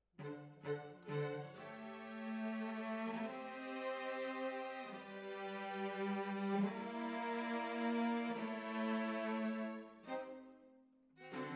Cellos Over

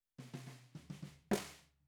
SNR BOT

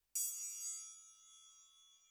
Perc

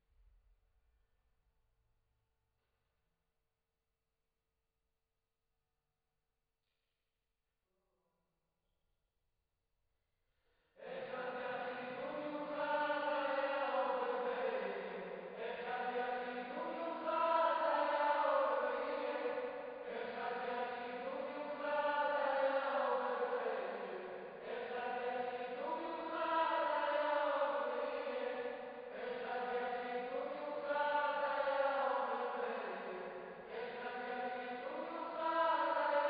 Choir

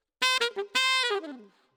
Alto, RNR for piano

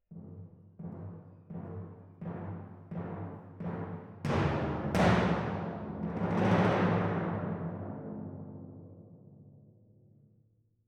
Timp

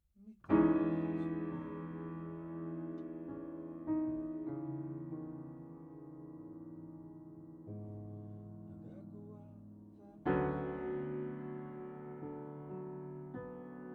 Piano Low

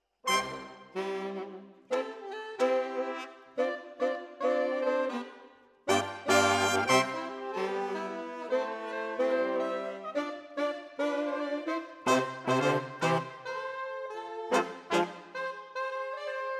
Sax

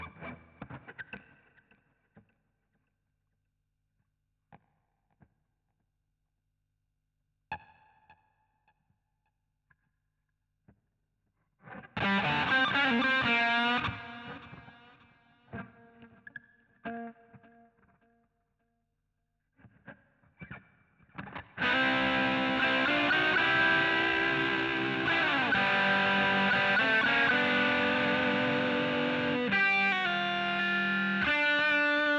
GTR A Solo

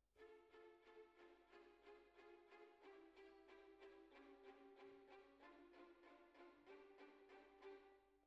Clarinet

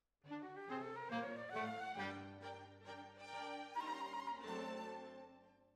Oboe

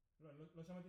Piano B

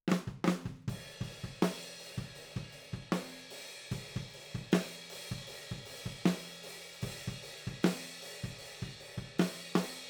OH A

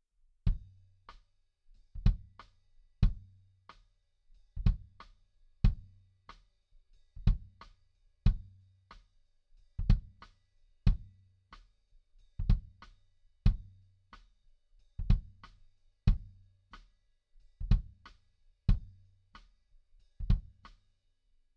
Kick in

